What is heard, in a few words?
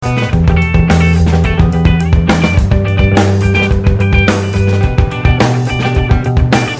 depressing rock music